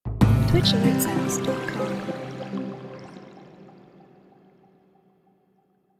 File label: Simple Host